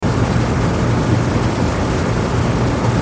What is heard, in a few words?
White Noise